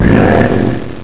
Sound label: SINK